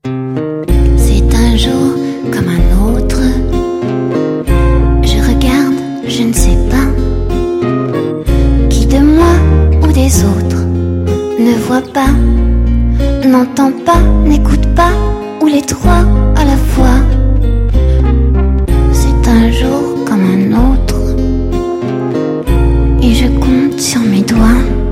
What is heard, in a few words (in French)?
chant; marie claire